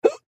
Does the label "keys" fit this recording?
No